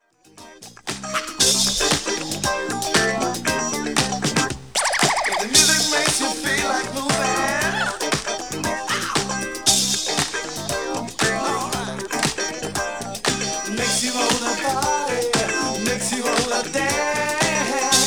BRASS

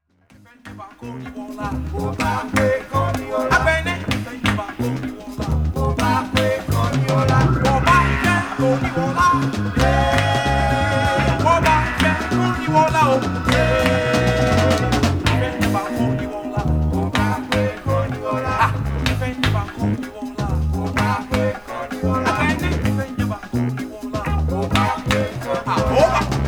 vibes

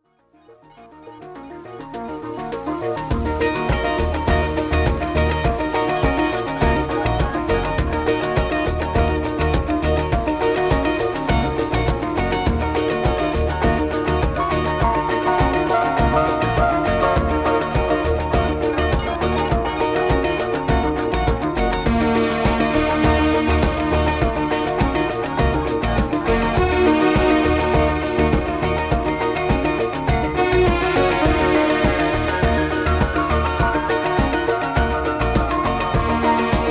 disco

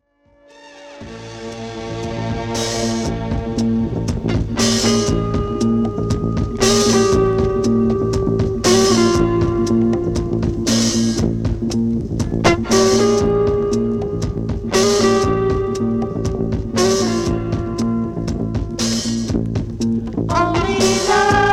FUZZ

